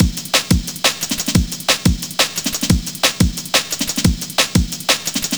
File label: break